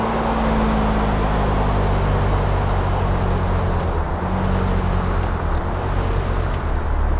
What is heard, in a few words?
throttle off fast, vehicles; primo